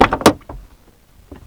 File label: LOADING, Transportation